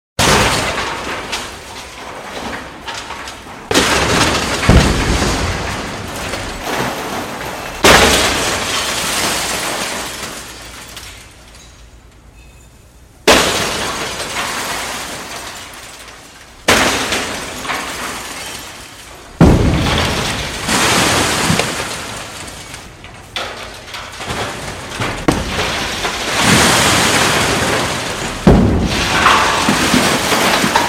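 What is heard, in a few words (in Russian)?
wrecking ball hits on a building wall for demolition; Звуки строительных работ обычно связаны с разрушениями, такими как снос зданий, разравнива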